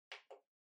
Portable Stereo Far Button

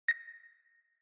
Countdown

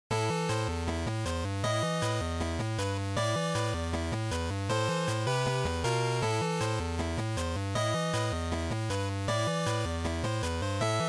GB RPG Battle

